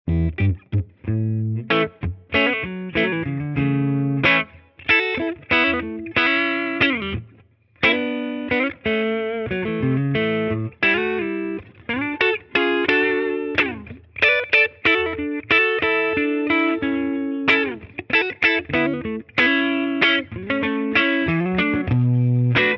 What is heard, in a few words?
Les Paul soul